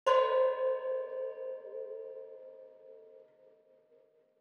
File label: ambient